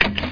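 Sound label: rifle out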